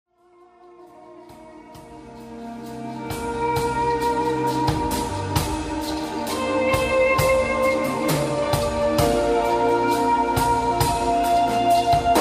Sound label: Live Vocal Jam